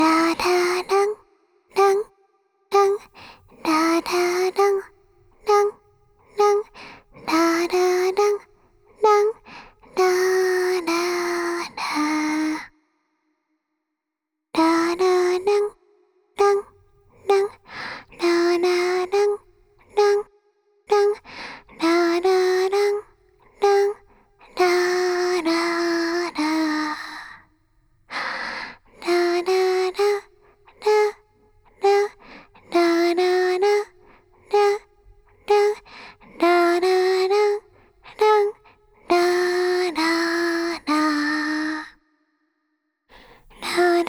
子守唄 リバーブあり; 楽曲

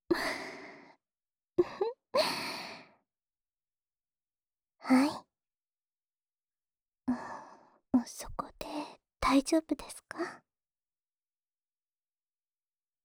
左耳の 高級 耳かき; 力を抜いて味わうと、より幸せになれますよ♪; ASMR

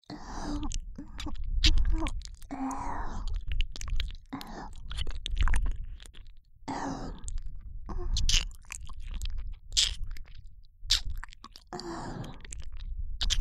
しゅきしゅき囁き左耳舐めループ 耳塞ぎ; 耳塞ぎ舐めver

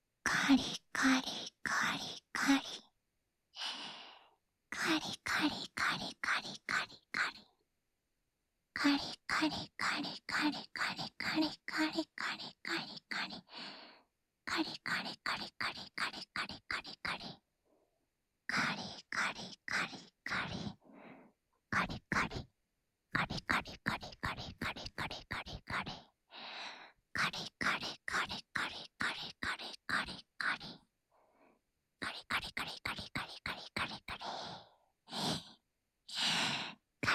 乳首責め（かりかり・右）（SEなし）乳头刺激

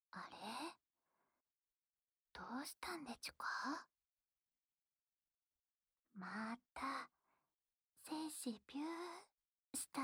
耳舐め ループ（右耳; オナサポ用

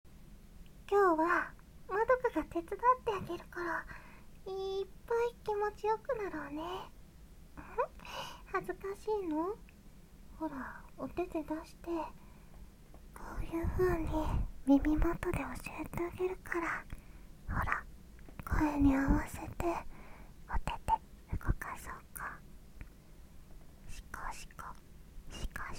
耳舐めASMR

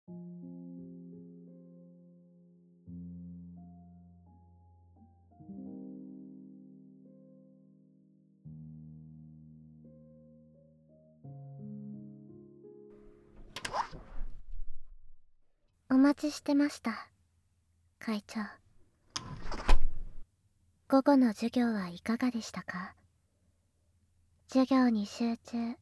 ピアノ練習室; 反転; ASMR

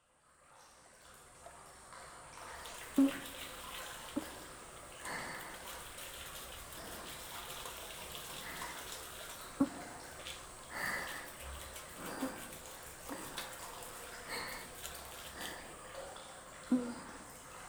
泡立ち悪い 炭酸泉 で、石鹸 シャンプー ＆ 天然炭酸 マッサージ

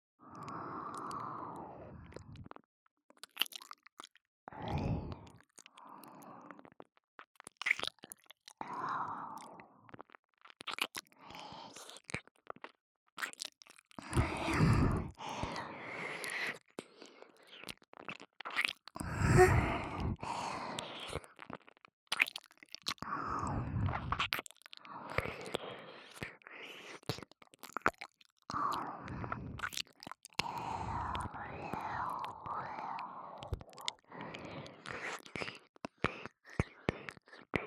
左耳舐めループ

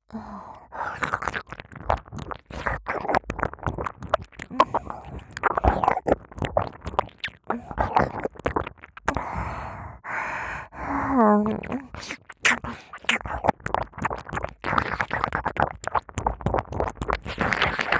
左 耳舐め, ASMR; マッサージフェラでお漏らし射精

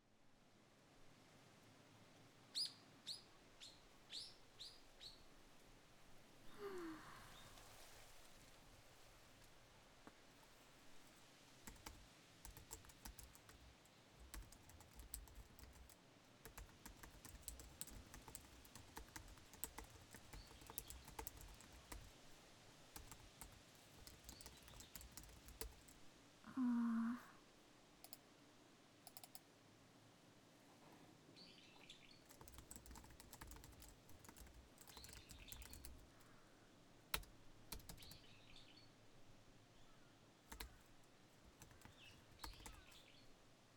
PC作業をする＆風の音、鳥が鳴く声 セリフなし

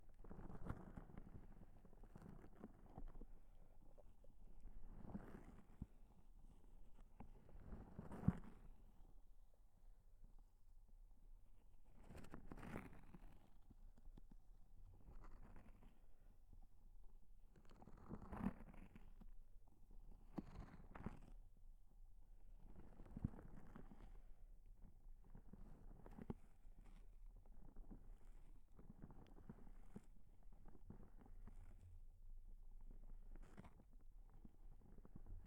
ジェルボール, 左耳

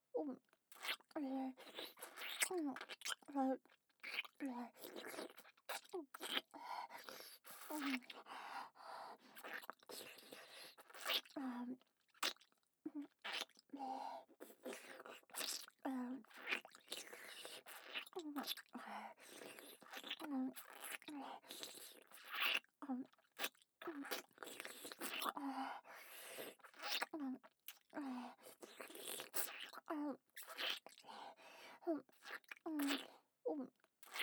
乳首舐め 右

